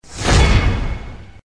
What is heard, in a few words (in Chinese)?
推人声